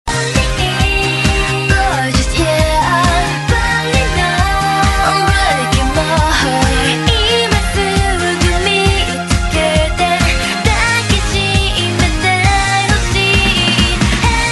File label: Chorus; Parakiss